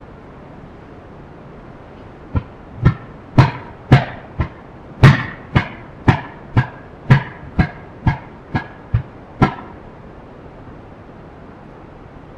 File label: Wood Knocking